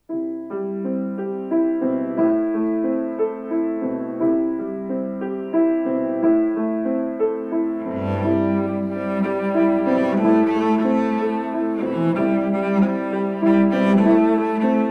cello

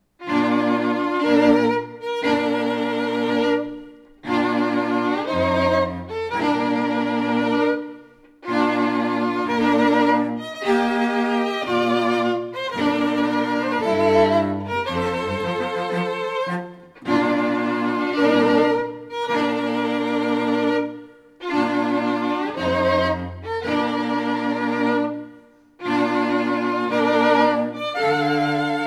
Bridal Chorus majestic, String Trio

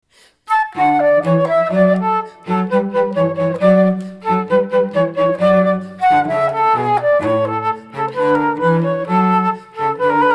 cello flute duet in D; Mozart

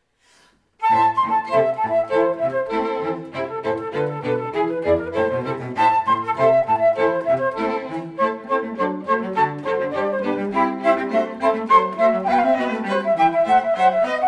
Badinerie flute quartet

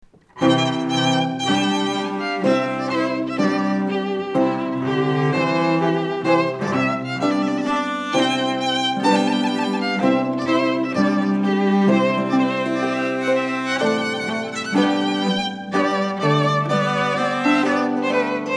Trumpet Tune

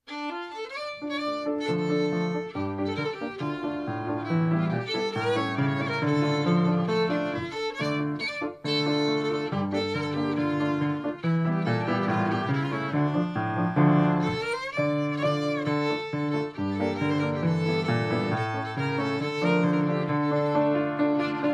Klezmer

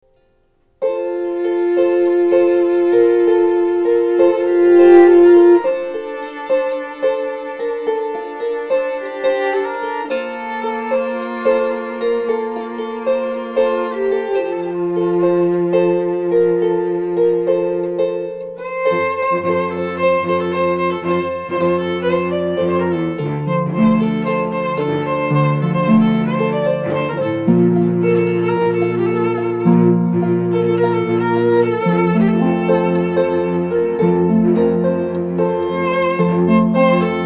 harp trio

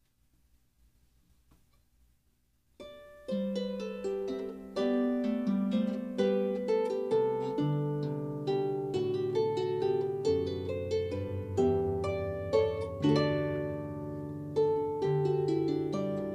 harp Passepied; Handel

